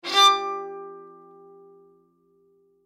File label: Violin Stab High, Violin Stab High Pitched Ring Out; String; Musical; Blastwave